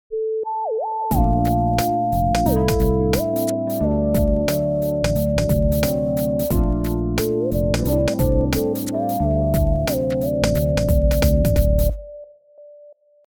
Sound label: RnB Lead